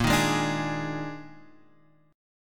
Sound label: Eb Major 7th Suspended 4th Bb